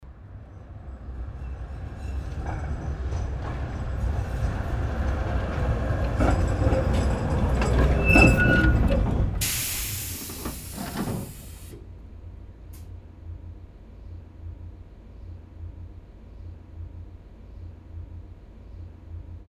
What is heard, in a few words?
tram arrival